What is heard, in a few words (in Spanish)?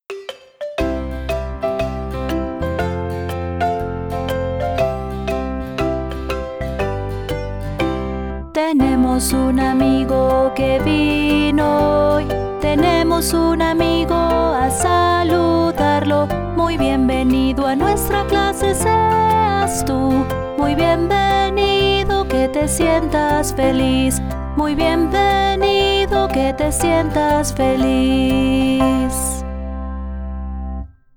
Canción de visitas; primarios 2026